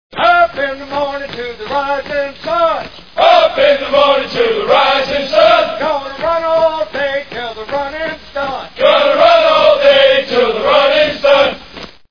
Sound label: run